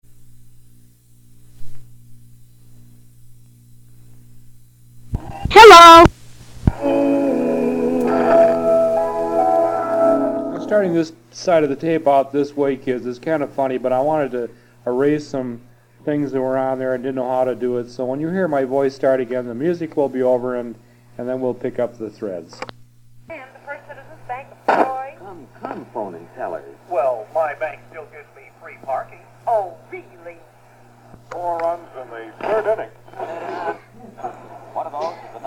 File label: noise; tally ho 1976; hayward; Baumgarten's Bar